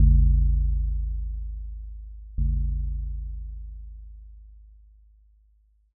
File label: joya bell